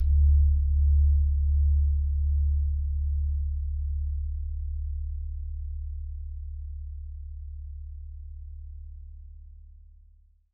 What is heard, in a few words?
gong